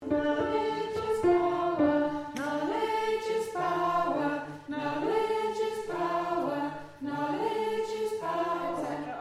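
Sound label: Tenor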